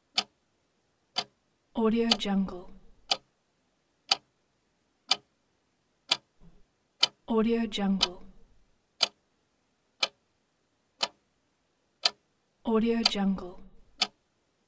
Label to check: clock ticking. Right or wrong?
Right